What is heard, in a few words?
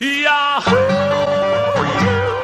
yahoo yodel